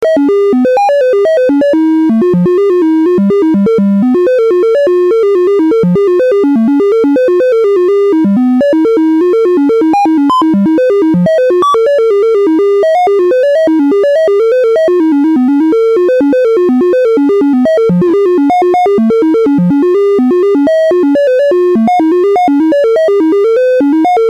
samp hold whole steps, analog synth voltage quantizer